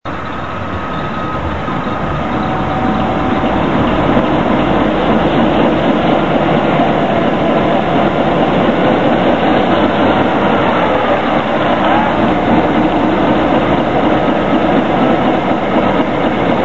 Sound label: start